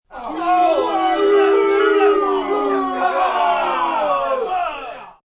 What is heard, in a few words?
Group Boo Males, Booing, group. 5-10 Males only, long, sports (human voice); Sound Effects:Voice; VoiceBox